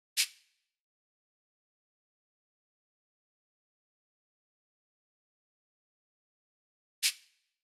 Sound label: Drum Loop 140 BPM Perc; Loop Stems; Cymatics; Mystery Pack Vol 4; Birmingham